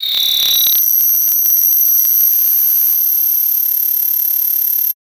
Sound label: Digital Buzzing Rattle Glitch